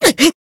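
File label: Voice damaged, Japanese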